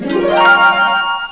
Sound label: magic